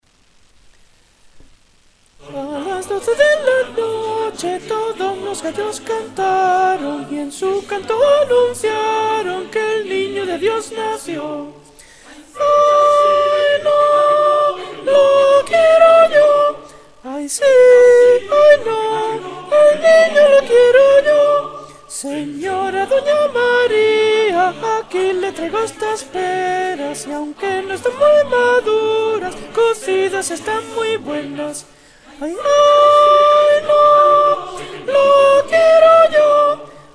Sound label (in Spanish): SOPRANOS; navidad